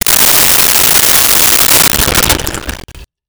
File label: Sink; Liquid